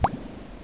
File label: drop